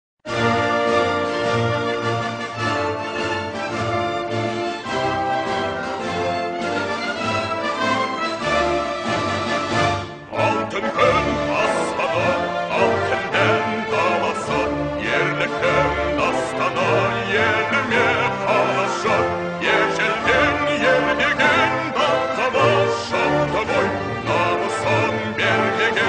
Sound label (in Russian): gimn kazahstana